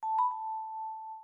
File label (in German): Klingel Triller